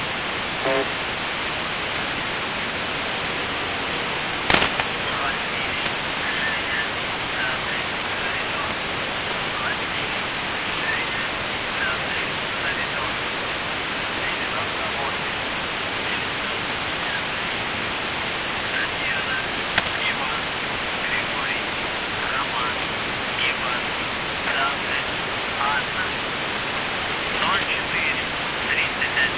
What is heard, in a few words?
buzzer usb msg